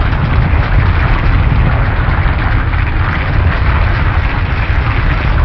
rolling

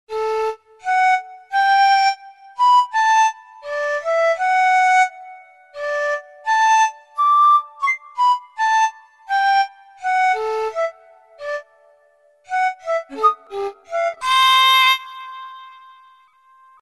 Ocarina Novice Mix

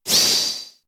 Power Gem; pokemon reborn e19 2022

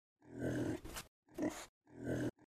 prickle; Blackeye

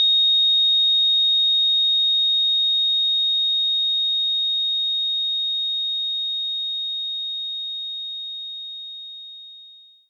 Cold Glass Wave; knights try

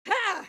Voices female attack shout; Out of Reach: Treasure Royale

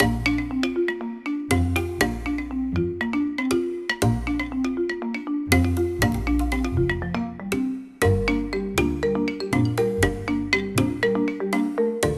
menu music

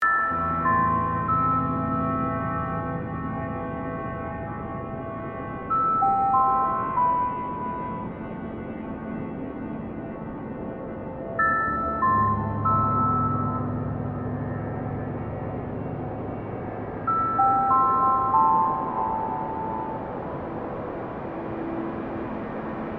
Sad music; Among the Sleep

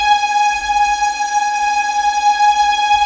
tremolo; Level4 Hidden World

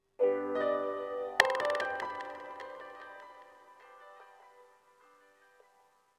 Oneshot Piano And Perc; Zombie Army 4 Dead War; PS4 Stadia Switch Windows Xbox One

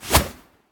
whoosh nylon; Scrat

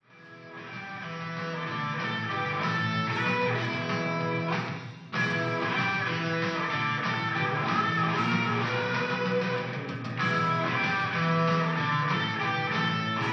Rock

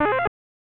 Classic Jump; Pitfall The Mayan Adventure; SNES

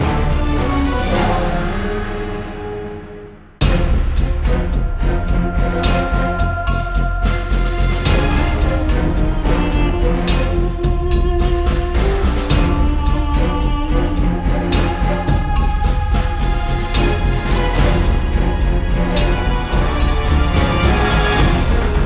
Lose; modern combat domination; ps3

